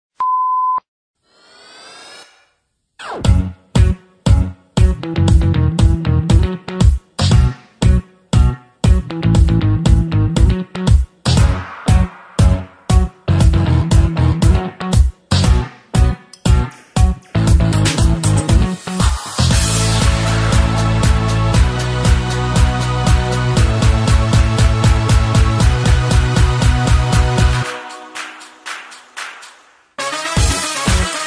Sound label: Sax